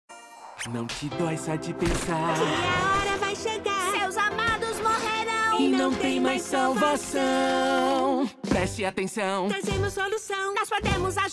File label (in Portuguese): Jingle; Dublagem Prime V.; C.H.E.R.U.B; Helluva boss T1-ep4